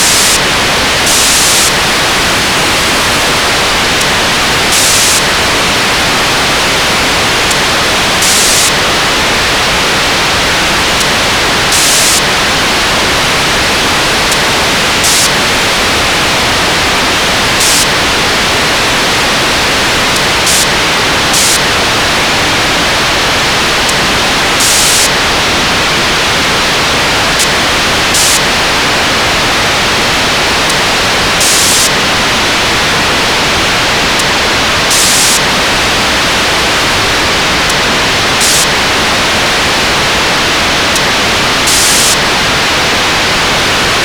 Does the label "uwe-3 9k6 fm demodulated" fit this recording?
Yes